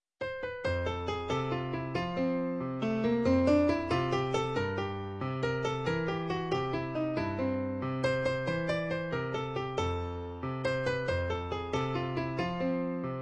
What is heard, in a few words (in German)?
hat dance, Klavier